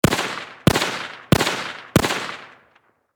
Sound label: Warfare Rifle .30 Caliber M1 Carbine Semi Automatic Four Shots Distant Perspective